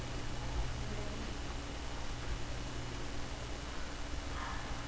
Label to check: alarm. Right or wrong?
Right